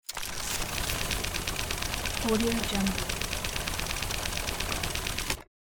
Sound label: money counter; دستگاه اسکناس شمار